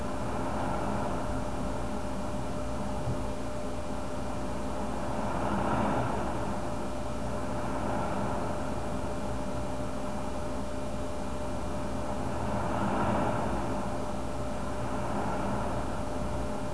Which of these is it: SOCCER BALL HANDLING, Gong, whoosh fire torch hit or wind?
wind